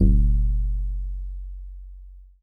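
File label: Steel Drums DX100 F#3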